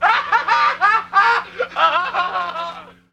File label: Johns Laugh